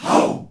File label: GROUP HOW, SHOUT, Heart of Africa; Spectrasonics; Heart of Africa 1; CH. SHOUT1